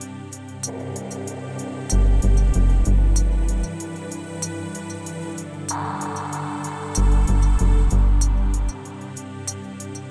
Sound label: vibes